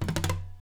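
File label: scrape, guitars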